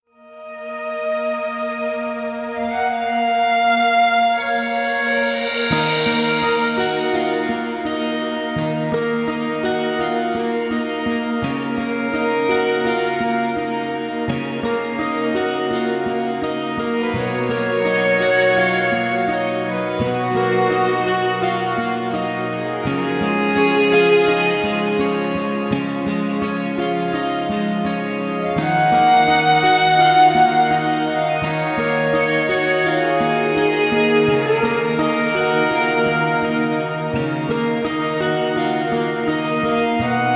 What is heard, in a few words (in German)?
Entspannungszeit Melodien der Natur; gefuehlvolle entspannungsmusik